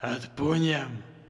agression, satan; customnpcs

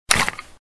heavy weapon pick up

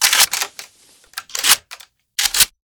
groza load, weapon

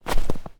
snow footstep

